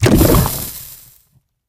Sfx - Material Lava Melt General

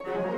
Joyful Start 60bpm; asterix obelix slap them all 2; Music Dialogue

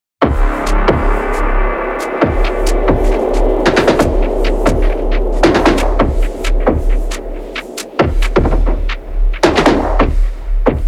Combat Loop 135bpm; Combat1v2

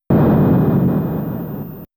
Miss; Xevious

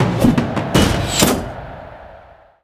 Win; Spartan

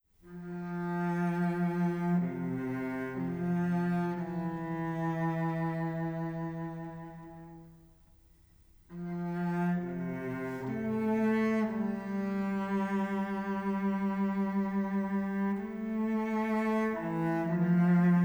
String Quintet, Chamber Music; Total War: Napoleon Remastered

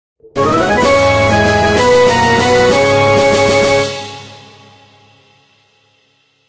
victory; luna monsters tower defense the deprived magical kingdom

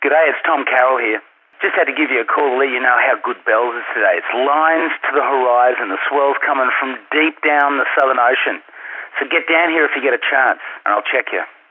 Bells Ghost

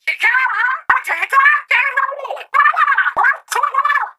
Cheers Trying for the Ultra Signal; Grand Fest Tricolor Cheers

